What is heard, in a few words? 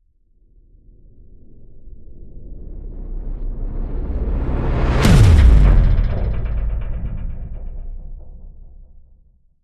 Riser Hit